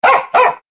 dog barking

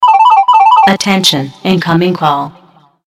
attention incoming call

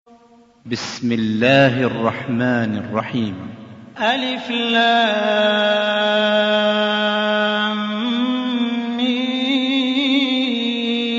quran

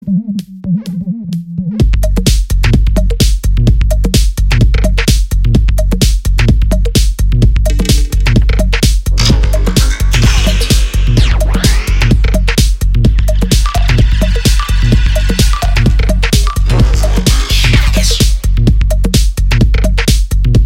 minimal

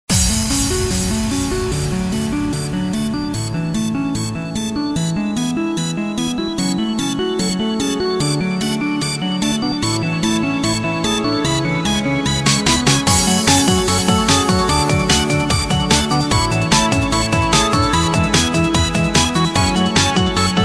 techno

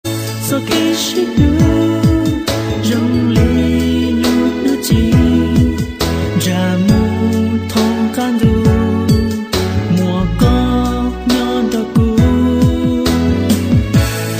hmong song

